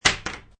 Ping Pong Racquet, Ping pong racquet slammed to the table; Sports